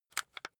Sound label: rock drill head remove heavy; bohrer; soundpool